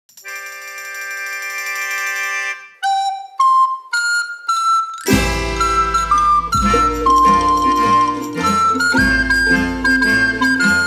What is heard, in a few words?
Heartthrob Flute Only ver.; Secret Ending; Tokimeki Memorial